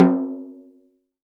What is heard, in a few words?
Snare Drum; Percussion Samples